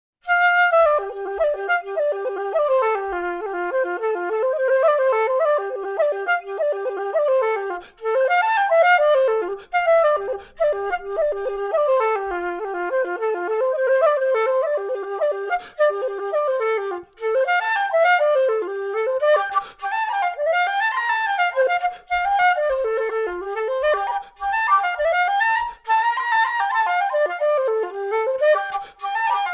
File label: F flute; pigeon; earls